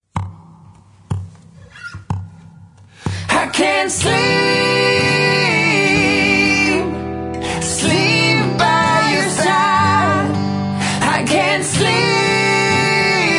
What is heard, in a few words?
Acoustic, Rock